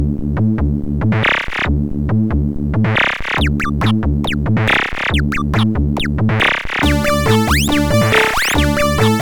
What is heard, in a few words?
Tracker Test; Ten Tracker Test